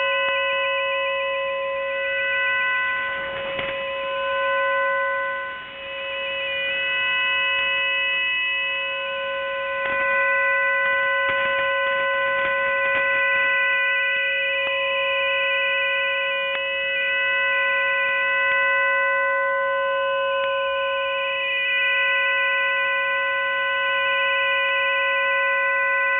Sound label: Ukrainian ITU.T R.38A VFT cicuit in idle and traffic state on channel 5; remaining channels in standby; UKRAINIAN MIL. / GOV.